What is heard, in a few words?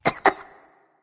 nv clear throat; smite; voicepack; ratatoskr